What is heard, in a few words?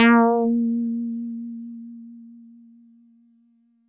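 Mono Bass DX100 A#4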